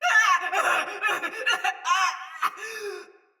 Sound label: Pain Heavy Soldier Mace Agent Female; Battle; Sinecure Melee